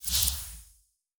ui mission refresh

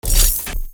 vwgun

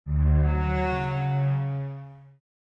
cello doubt

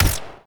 atk gunshot

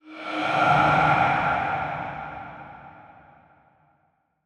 ghost sigh

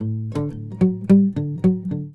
wdn guitar pizz

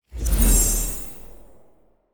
magic

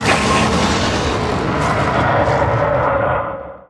dragon die

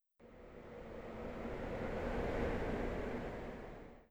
blizzard

